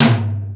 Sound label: DRY T, drum; Crypton; DrumBase5000